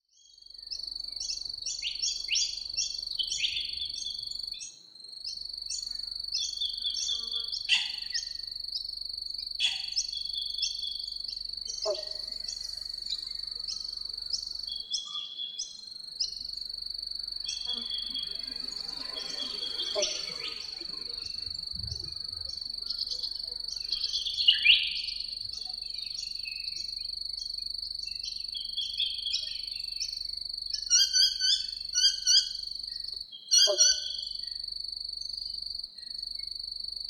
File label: Hattah Lakes Soundscape